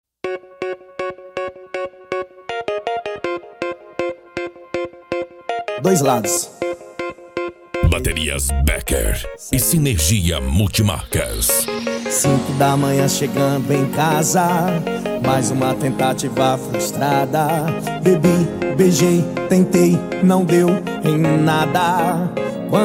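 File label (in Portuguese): especial sertanejo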